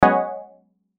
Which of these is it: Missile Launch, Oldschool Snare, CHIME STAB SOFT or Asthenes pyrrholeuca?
CHIME STAB SOFT